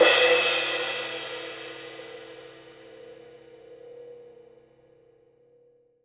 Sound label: CYM, Real Drum Kit